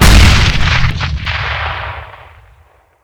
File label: explode, grenade; hegrenade; weapons; Counter Strike Model Pack; CS:GO